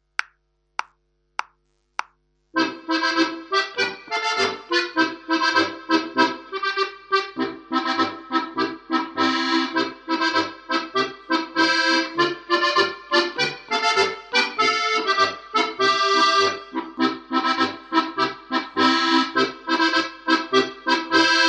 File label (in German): Marsch (1. Stimme)